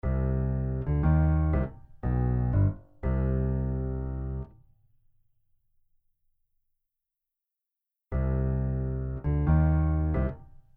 Melodic motif varied, with changes in tempo, timbre and dynamics